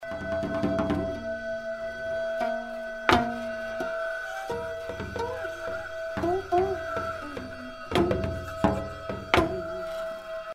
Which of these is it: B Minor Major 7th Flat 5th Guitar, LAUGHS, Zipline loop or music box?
music box